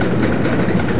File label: Chain Lift